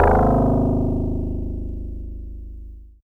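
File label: Easy Clav DX100 G#1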